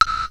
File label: DRUMS; Rubber Chicken Software Sample Bank